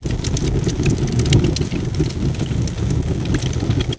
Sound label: fire